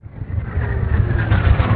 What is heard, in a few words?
Artillery; Red Orchestra 41-45